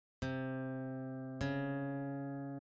interval minor second, guitar

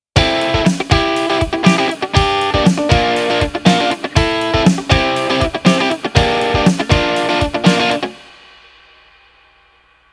Triad Funk Riff

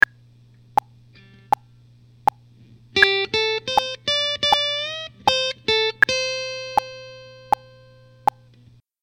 bend; beginner techniques; guitar

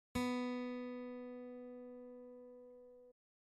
Tuning B, guitar

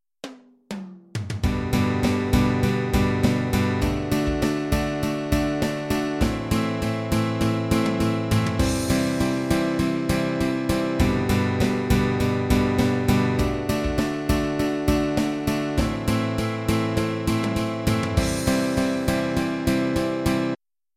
eighth note strumming with drums

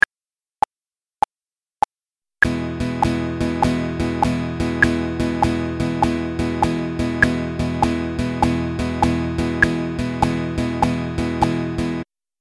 shuffle; 12 Bar Blues

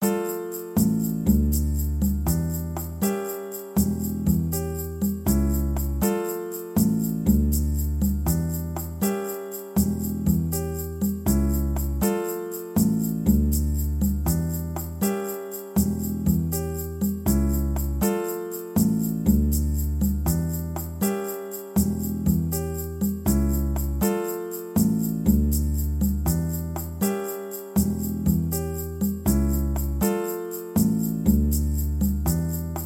alternate picking exercise backing track 80bpm

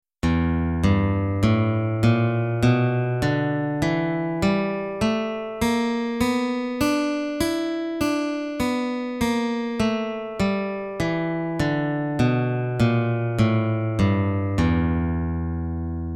e blues scale open position, guitar

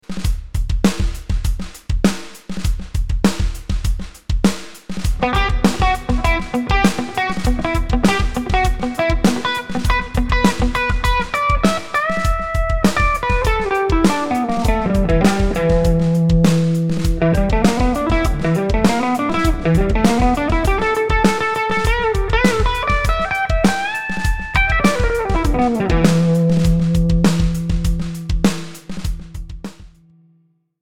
Palm Muting Solo

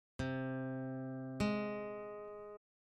interval minor sixth, guitar

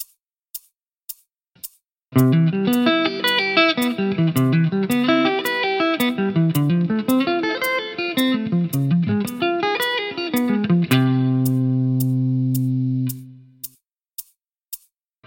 Sweep Picking ii-V-I

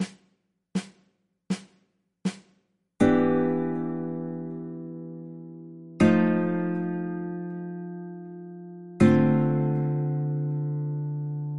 chord progressions iii IV7 ii V I, guitar

